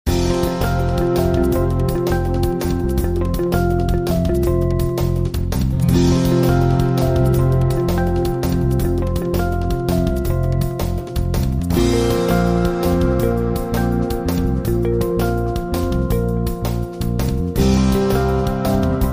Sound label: energetic motion